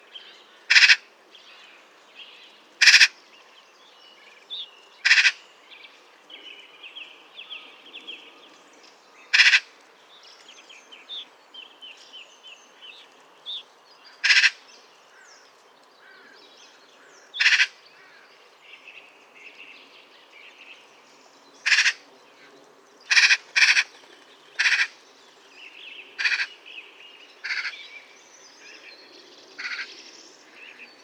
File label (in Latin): Pica pica; SETE